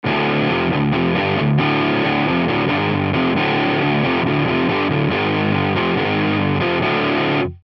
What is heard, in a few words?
Heavy